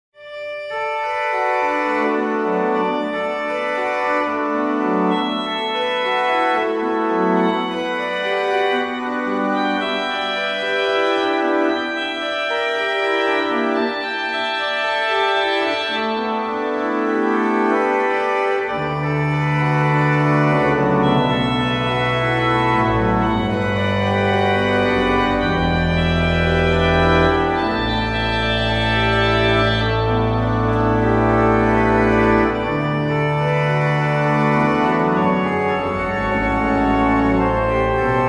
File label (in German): Praeludium Glocken, orgelklang; Josef Hofer; Präludium ‚Glocken‘; Orgelklang im ApfelLand